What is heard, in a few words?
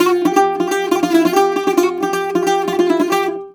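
bouzouki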